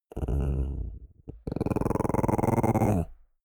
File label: chihuahua growl long; natur-tiere; hunde